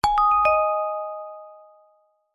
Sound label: multimedia alert chime short musical notification; zapsplat